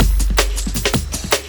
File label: God Chord Break; Jungle; Breaks; Blu Mar Ten - Jungle Jungle - 1989 to 1999 Samplepack